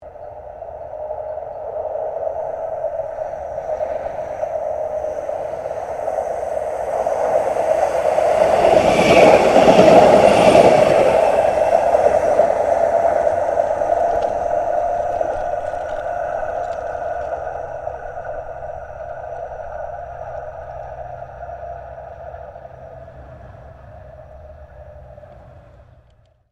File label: Tram Melbourne C Class Passing Long; Various Melbourne trams; Streetcars; Vehicles